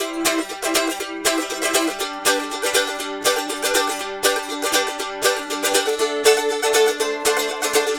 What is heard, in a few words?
Mandolin Rhythm; Appalachian; Continental Drift Aftershocks